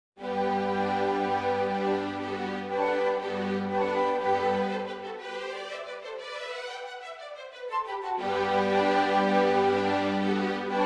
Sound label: ballet-pantomime, MIDI version; PARIS VERSION; ninette a la cour; OVERTURE